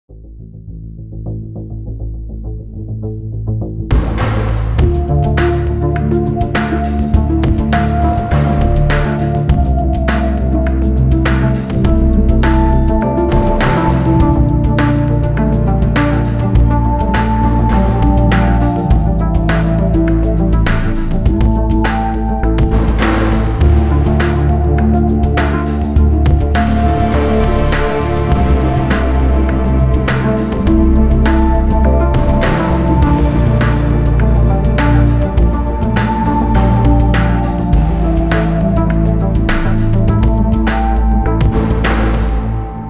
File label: Bass Intro